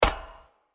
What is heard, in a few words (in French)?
Footstep passerelle metalique; Blind Survivor